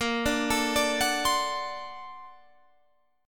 A sharp Augmented Major 9th, Guitar, Half Step Down